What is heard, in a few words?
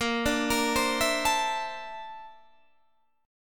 A sharp Major Sharp 11th, Guitar, Drop D